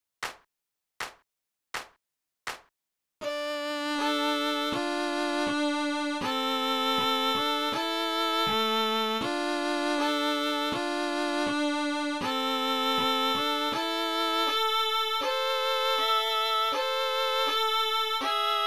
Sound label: First Song Violin